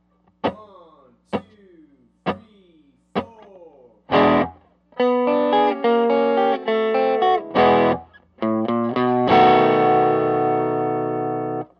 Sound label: Blues Turnaround in E